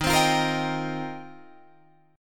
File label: D sharp 6th Add 9th, D sharp, down, Guitar, Drop D